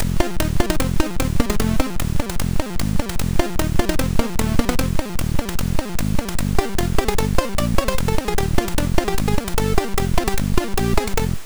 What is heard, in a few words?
Circus